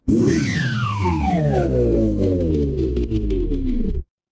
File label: mechanical machine